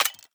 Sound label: ui tower Wheel Drag tap Off